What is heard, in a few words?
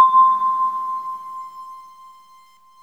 musica C5